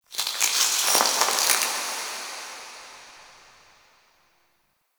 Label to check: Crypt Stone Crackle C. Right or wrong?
Right